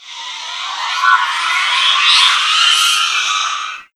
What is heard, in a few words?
NERVOUSLY, Disjointed Textures; USB Soundscan vol.33; AKAI; CHAOS